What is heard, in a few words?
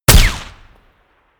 gun shot silencer designed ricochet